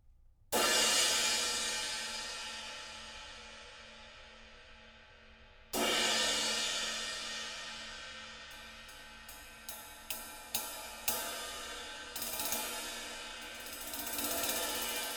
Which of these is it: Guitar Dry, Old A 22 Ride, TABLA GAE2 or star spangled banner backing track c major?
Old A 22 Ride